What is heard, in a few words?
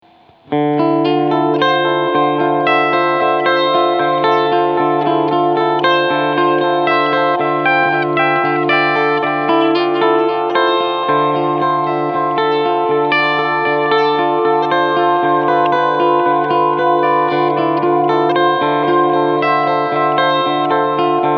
Joyo Aquarius Tape Echo